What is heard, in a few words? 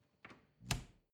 refrigerator door close moan